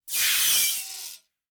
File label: evil screams high pitch squeal feedback; prosoundfx